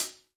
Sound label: hihat, house